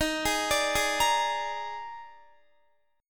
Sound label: D sharp Major 7th Suspended 4th, D sharp, down, Guitar; arpeggiated; D#M7sus4; JGuitar